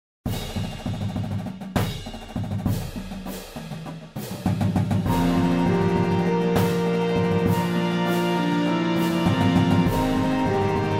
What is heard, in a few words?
Fanfare perc